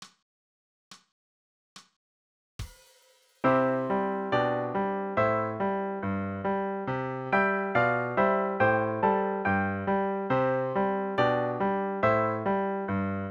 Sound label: Do Re Mi